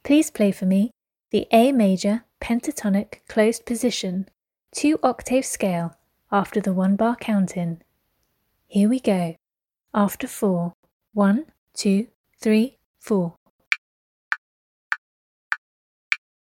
a major pent closed

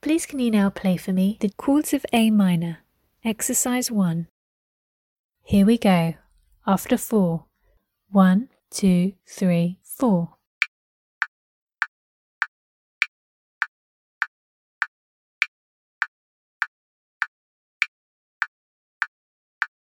chords of a minor

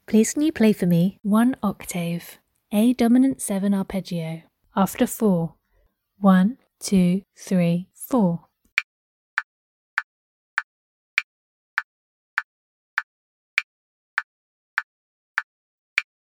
a dom 7 arp

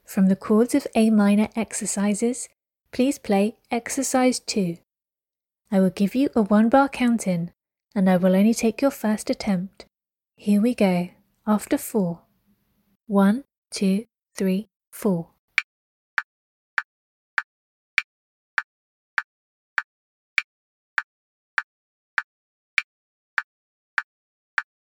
chords of a minor

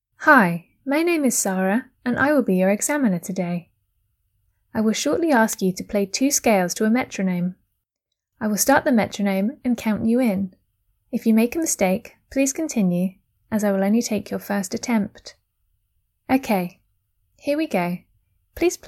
1 octave scales test; ABRSM

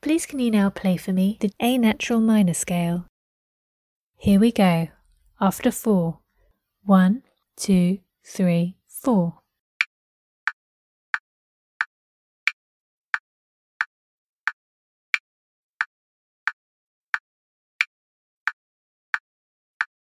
a nat minor scale

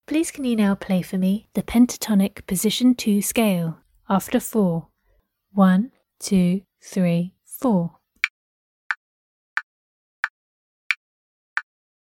Pentatonic position 2

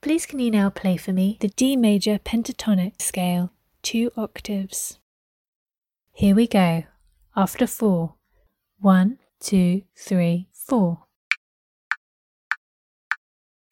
d major pent 2 oct scale

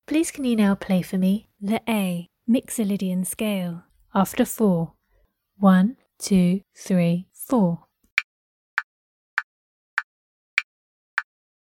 a myxolydian scale

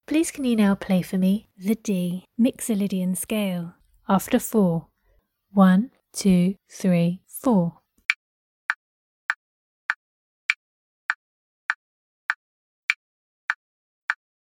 D myxolydian scale

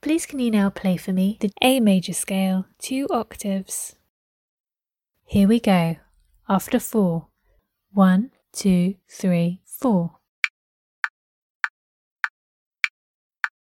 a major scale 2 oct scale

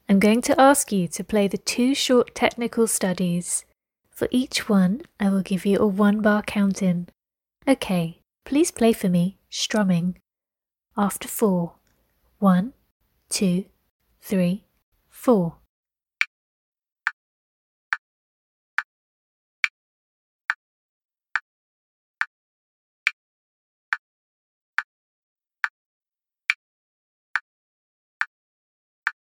Strumming

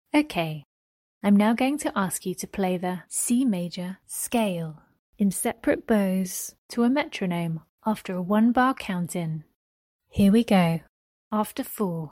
c maj scale sep bows

